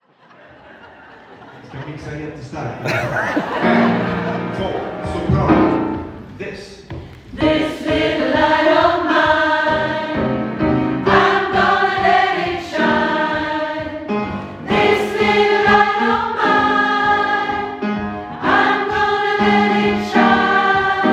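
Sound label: This little light & Go tell it, Soprano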